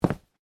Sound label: Footstep wood light, Shoes: Individual Footstep On Wood, Light; Human; Footsteps; Stuart Duffield